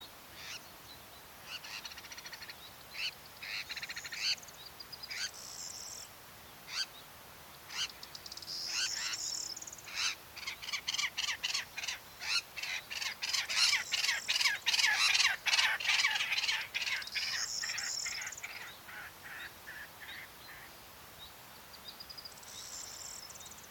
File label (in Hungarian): pettyes kakukk hangja